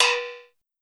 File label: Steel Lite Drum, Metallic Percussion